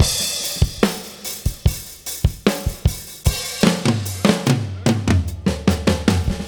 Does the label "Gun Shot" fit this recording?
No